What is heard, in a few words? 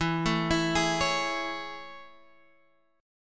A Major 7th E